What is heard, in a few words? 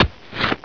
AXE